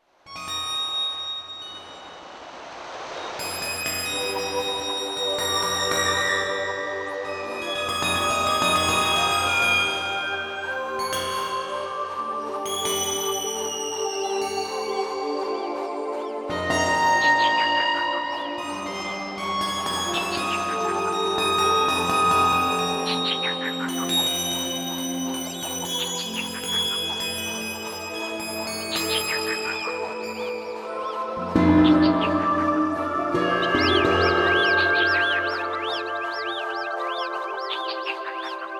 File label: ambient